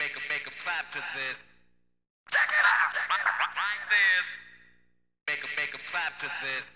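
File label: vocal, A minor, Hip Hop & RnB; Saturday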